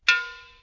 Metal Tube

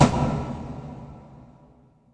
tom verb

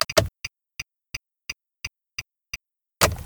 Nissan Sentra Turning Signal

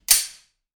Pistol Gun Magazine Reload

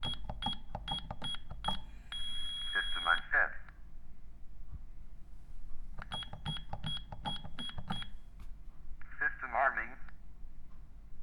Alarm System Set Unset

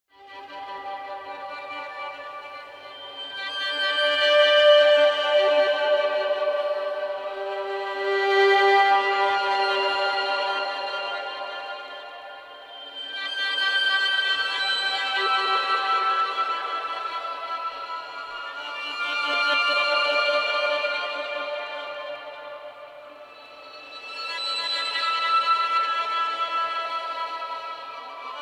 Violin Mystery

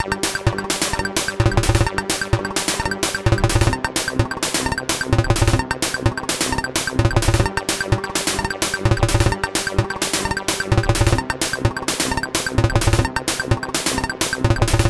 Choppy Retro Loop

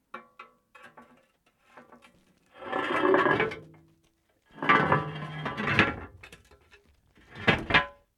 Manhole

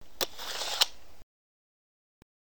Mechanical Measuring Tape

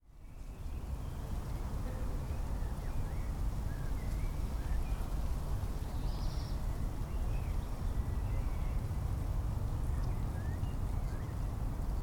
Garden Atmosphere In The City

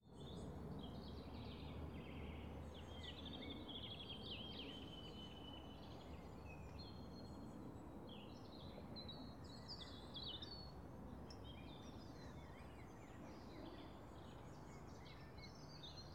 London Park Middle Of Field Atmosphere

